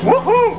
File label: gwoo